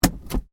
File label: Handbrake Off, car; Hyundai Accent 2008; Hyundai hire car; Vehicles